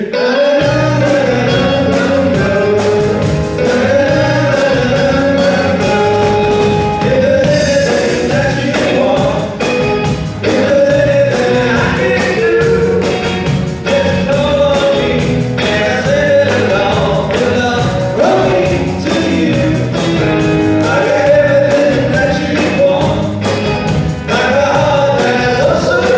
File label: Tribute Band; Indianapolis